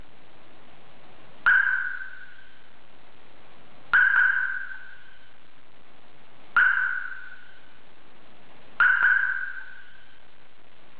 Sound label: siren